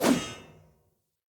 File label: Sphere Polearm Whoosh; Weapons; Player